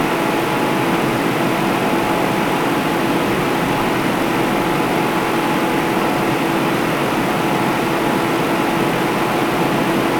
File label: Gaming Noise